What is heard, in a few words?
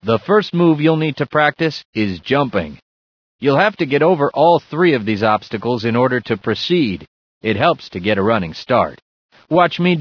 holo jump; source engine; bshift; ba holo